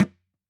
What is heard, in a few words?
dhi, mrid